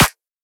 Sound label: clap snare; Anthemic